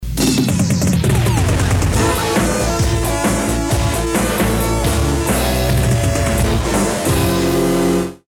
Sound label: JINGLE Medium